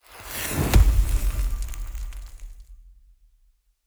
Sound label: High Symbol fruit blaze; netent